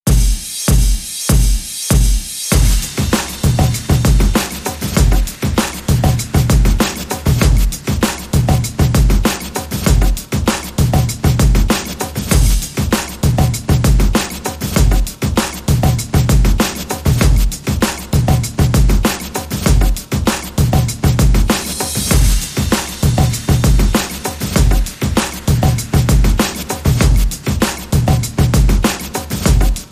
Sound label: Shaker Beat